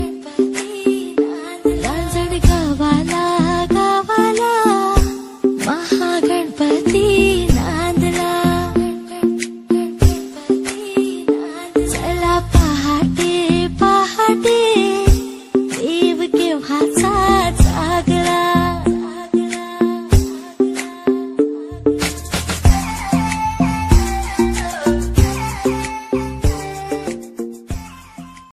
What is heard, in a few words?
romantic bollywood sound ringtone